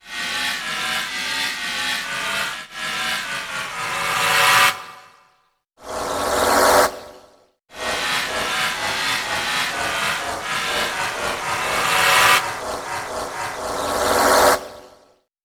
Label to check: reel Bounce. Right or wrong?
Wrong